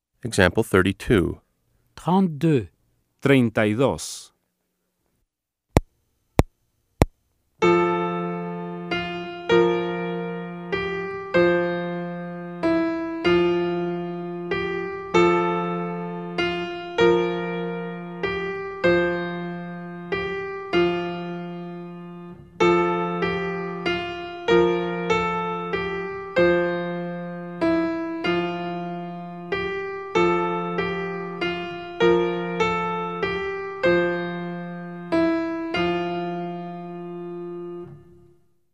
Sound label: Keyboard